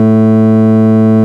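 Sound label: ORGAN Power A 2, Farfisa Combo; Keyboards of The 60's and 70's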